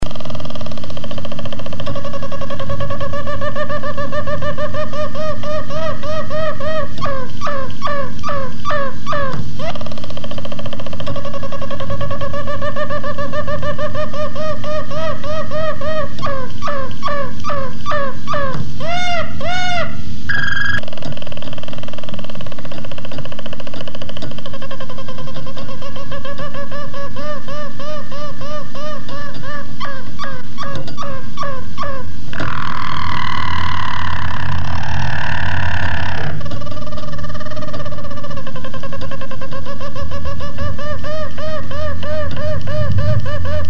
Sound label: Chant